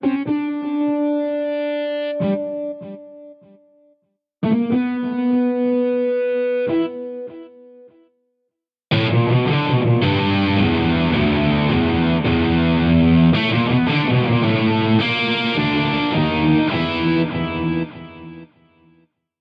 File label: Hard Rock